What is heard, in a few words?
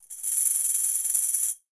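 Tambourine B FX; Latin Percussion; Drums; Drum Hits; Ableton; Factory Packs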